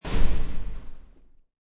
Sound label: Garage Door Slam, Door to parking garage slams shut